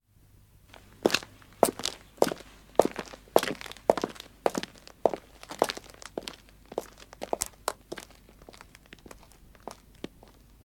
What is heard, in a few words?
Footsteps Road Walk; The 39 Steps